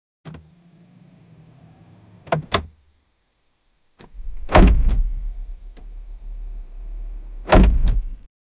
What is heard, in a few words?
cars